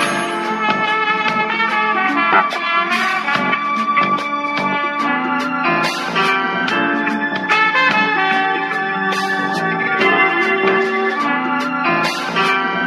horns and piano, phonky loops

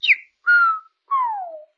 bird training; Zaps and Beeps

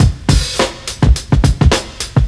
sinthetic subs, Drum loops Boombap

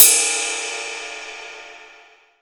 Cymbals

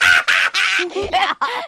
laugh child; ooh ahh

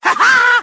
"ha ha", Voice

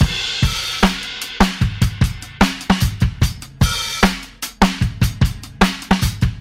with echoes, drum loops, boombap

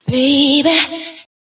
"baby" SUNG; Voice; ooh ahh

